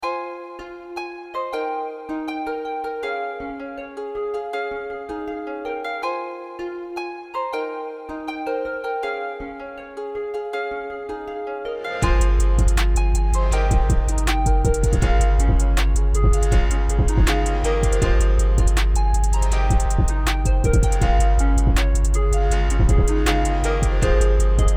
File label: beat